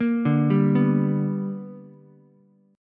B Minor Major 7th Sharp 5th B, Ukulele; BmM7#5 arpeggiated; 3,2,3,2; JGuitar

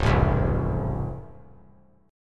Db Augmented Major 7th, down; strummed; JGuitar

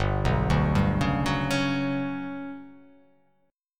G Major Sharp 11th G down, chord; arpeggiated; JGuitar

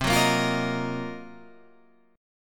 D sharp Minor 6th Add 9th C, chord

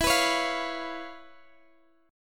C sharp 7th E; C#7/E; strummed

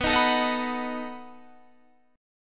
B 7th B down

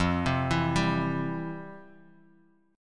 F Major 7th Suspended 2nd, F, down, guitar

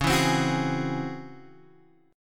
Db Minor Major 11th Db Guitar Open G

